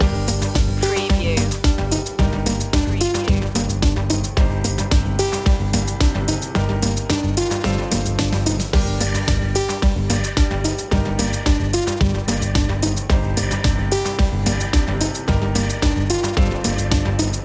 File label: corporate machine loop; media music now